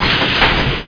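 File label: elevator; MOTS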